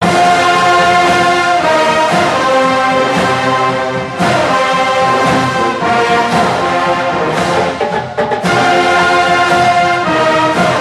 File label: warchant; FSU